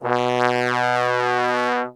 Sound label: B1 BASS TRB, Brass; Best Service; Brass Super Section